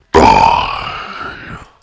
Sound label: Monster Die